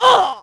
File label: uohh; Splashdown; XBOX; Umi